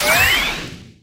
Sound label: gadget; piper; Brawl Stars